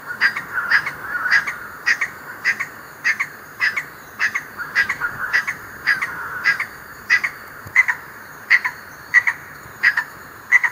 animals fleeing in fear, deer

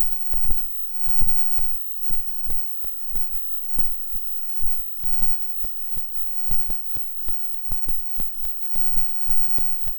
high frequency flickering, electrical

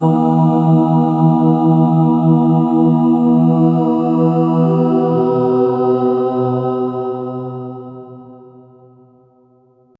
low pitch female vocal dr

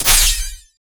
shield buff, rpg; jrpg

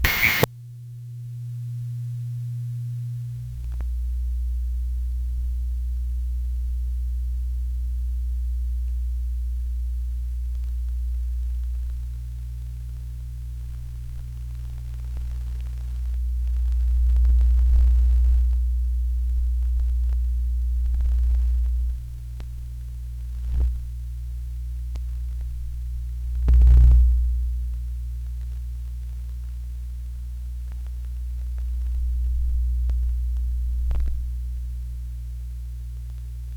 low level broadband hiss t, analog

